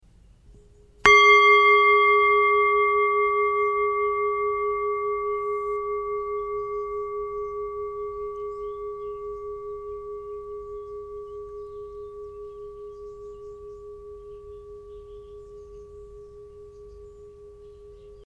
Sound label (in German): Klangschale